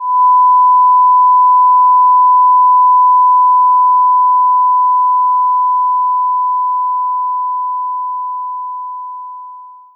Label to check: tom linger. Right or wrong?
Wrong